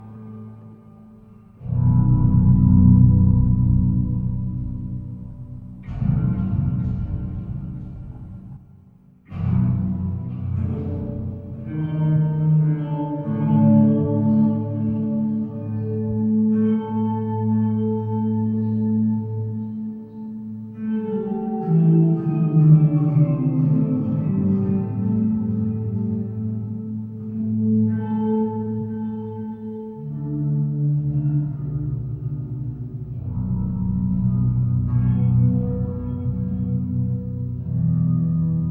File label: Rust Chords & Harmonic Ruins